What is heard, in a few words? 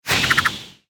Spinjitsu Ice Shuriken Project